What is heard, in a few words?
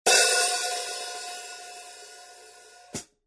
13in Custom HiHats, cymbal sound